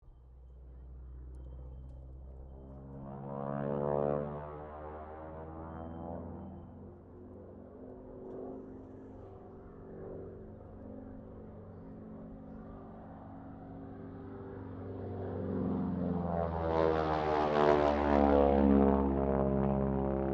AIRCRAFT AT-6D HARVARD MK III LOOP; Temora Harvard MK III; Airplanes; Vehicles; Airplane Sound Effects

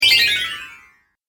App Game Spell, magic; Magic Sound Effects

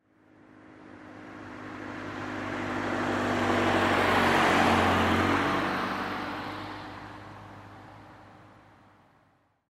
Saturn Sl2 1996 Drive By Left To Right 25 Mph; Transportation Automobile; Car

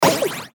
button burst digital; Multimedia; Buttons

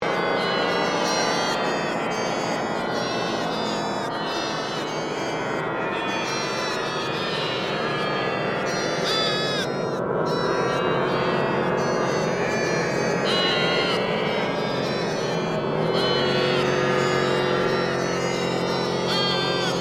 Created Ambience Rural Crowd; Various Ambience created through sound manipulation in FMOD; Artificial

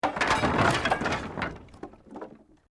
Wood Collapse; Created dramatic effects of buildings collapsing or breaking; Materials

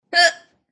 Female Hiccup, Female hiccups.; Hiccupping; Sound Effects:Human

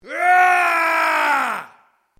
MALE ROAR AGGRESSIVE SHORT; male vocalisations; Yelling; Voice

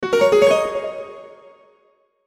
Logo Piano Melody Reverb Fast; Sonic Logo; Blastwave

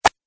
Golf Swing Hit; Golf Sound Effects; sports sound effects

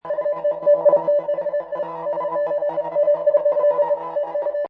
Space Scifi Sound Communication, Sci-fi radar; Sci-fi radar or sonar signals, morse-like futuristic digital radio tran; Lynne Music

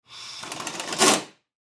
Air Ratchet Run Rumble Short; Blastwave

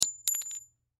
Bullet Shell; Bullet Shell 45 Drop Cement; Drops (Sound Effects:Objects); Blastwave; Warfare

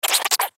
Squeeze Condiment, Squeeze Condiment Bottle; Cartoon

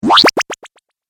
8 Bit Style Power Up; Video Game Sound Effects

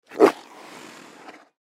Lion Growl Light; Blastwave

